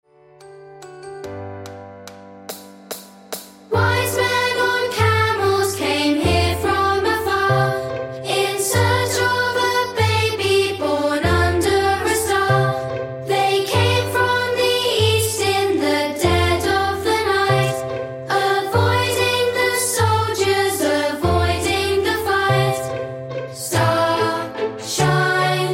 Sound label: Wise Men Waltz